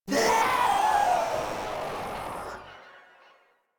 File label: Runner Death; Enemies; Vocals; Warframe; Proto Infested